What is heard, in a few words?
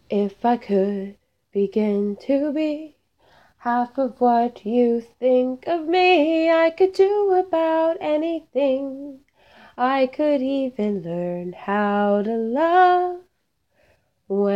SINGING, singing; steven universe